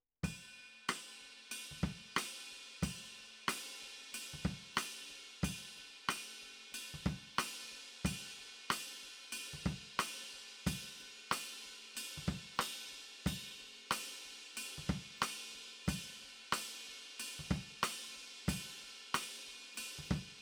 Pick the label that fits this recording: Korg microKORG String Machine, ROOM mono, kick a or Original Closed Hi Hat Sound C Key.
ROOM mono